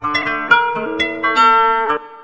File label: KOTO LP FX, KOTO FXLP; USB Soundscan vol.60; AKAI